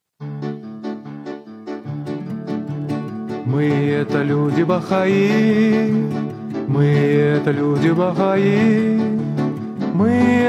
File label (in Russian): RU Song